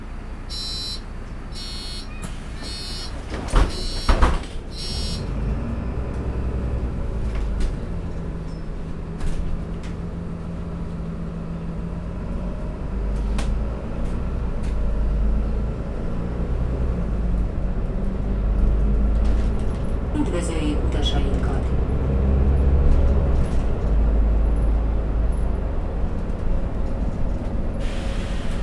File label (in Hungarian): busz egy megallo; 105os busz; gyongyosi uttol